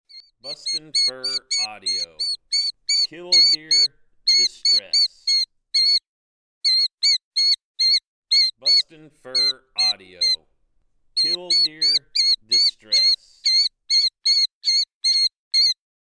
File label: Killdeer Distress